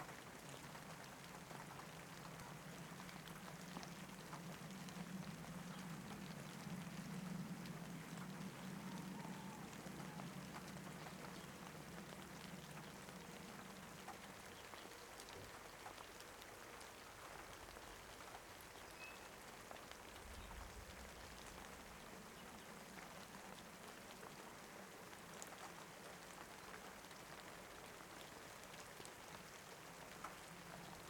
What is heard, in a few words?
Rain Sounds, Asleep in the Rain; Nature